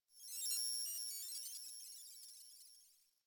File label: Computer Room Ambient Beeping; Warframe; Ambience; Nineteen Ninety Nine